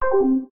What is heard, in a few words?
Undock, ui; LG L-Fino